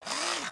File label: Fidget Arm Shake; emotes; Overbuild